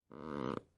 Wood Creak; lethal company; ambience; windows

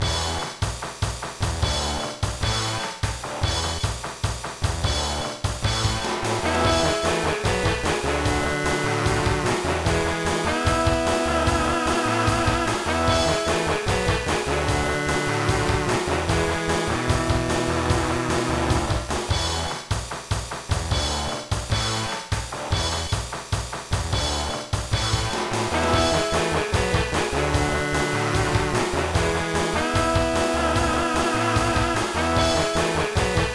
BGM; Toukon Heat; gba

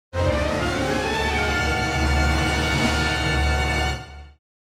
Purchase Free Creature; heroes of might magic 2

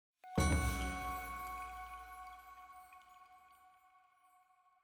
item Jingle Bell chime + Perc F sharp; Ori and the Will of the Wisps

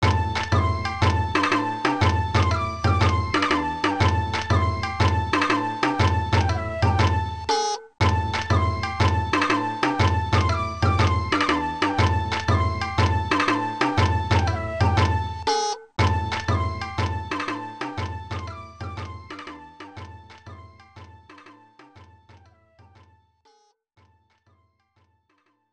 Ondo; power instinct 2; arcade; Alternative Version

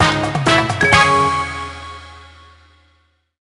Activate Cheat Code; penguins of madagascar the 2010 nds

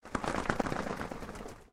Doublewing Flap; Lethal Company

